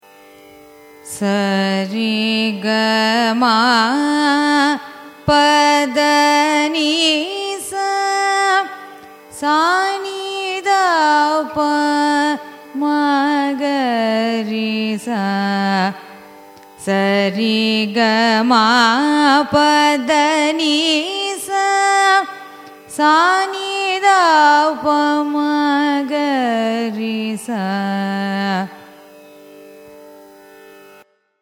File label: raga simhendramadhyamam arohanam avarohanam; carnatic music